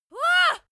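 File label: female attack ya soft, screams; schreie; menschen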